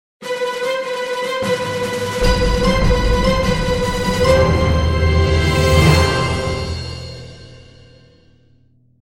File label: Staccatos